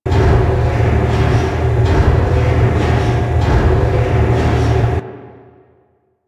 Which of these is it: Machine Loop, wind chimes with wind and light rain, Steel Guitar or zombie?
Machine Loop